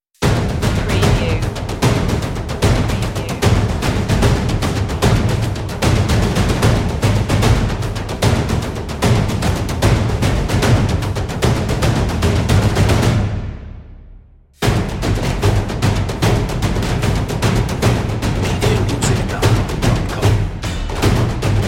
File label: epic percussion